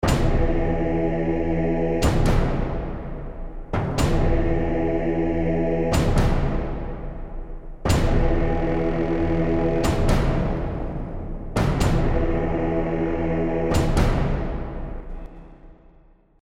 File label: Trailer Stinger